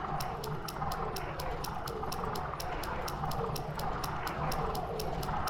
no win respin loop; arcane

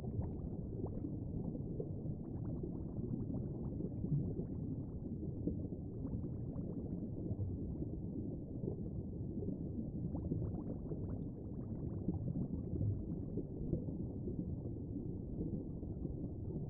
ambience; reef raider

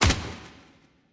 special Landed; the wolfs night

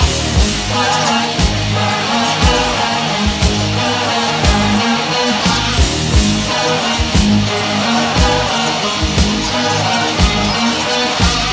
big Win Loop

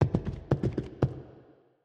walking Wild Movement; godsoffortune

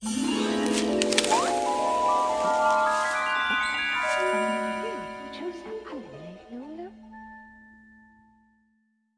bonus Game Start; fairyhansel

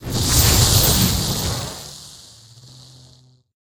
shield Glow; parthenon

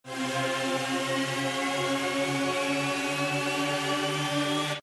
respin Snd; jackhammer

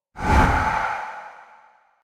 pick n Click haze

